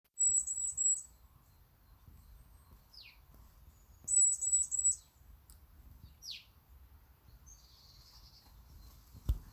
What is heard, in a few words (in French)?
Mesange Noire Chant